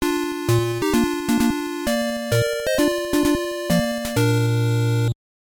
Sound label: Il Canto degli Italiani; Super Offroad